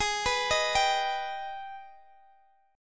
G sharp Minor Major 7th G sharp down Guitar Standard; arpeggiated; JGuitar